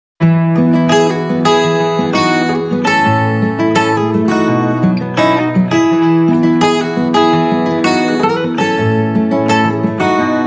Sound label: Guitars Only